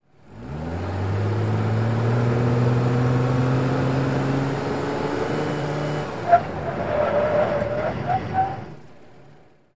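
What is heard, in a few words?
SUV Truck 180 Rev Skid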